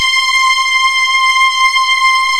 HIGH STRINGS

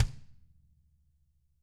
DW HARD, Double Platinum Drums, BRUSH; ILIO; Double Platinum Drums 1; DW+DW

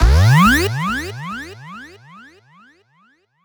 Sweeps Up, Effects

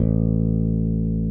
JAZZ FINGER A2, BASS; Greytsounds; JAZZ+P BASS

